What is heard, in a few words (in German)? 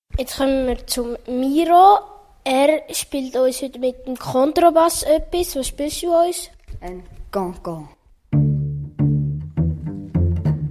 spielt Kontrabass; CanCan